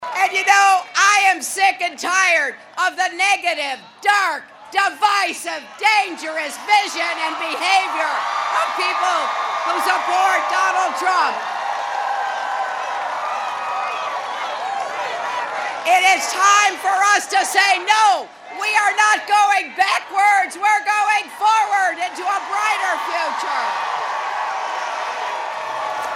SCREAMS AT CLINTON RAPIST SIGN; hillary

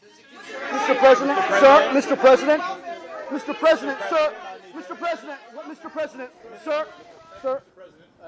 REPORTERS SCREAM; trump

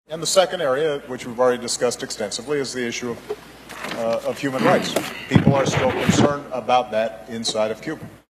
CLEARS THROAT fumbles mic; obama in cuba; CASTRO

